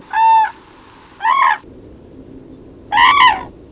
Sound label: call, Grus grus, Crane, calls from ground, there are at least two different call types; ALTITUDE: 5 m.